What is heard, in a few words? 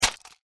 weapon hit